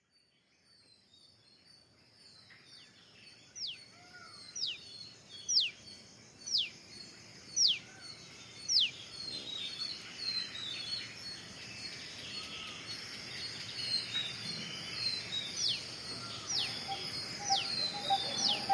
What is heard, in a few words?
soundscape birds goinjg off morning sound